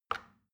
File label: plastic button tiny release regular